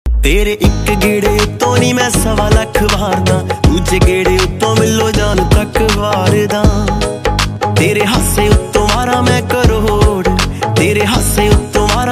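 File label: punjabi song ringtone, song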